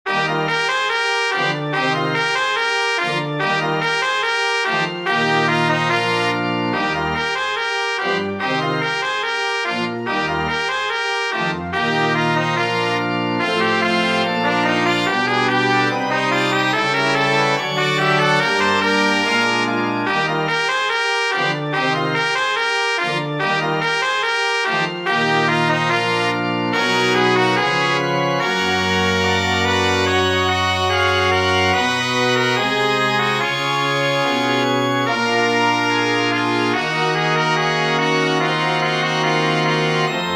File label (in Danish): indtogsmarch